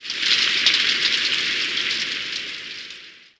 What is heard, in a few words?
snake, ambient outdoor